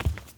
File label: footstep